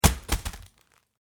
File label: Household Paper Towel Package Set Down Wrapper; Kitchen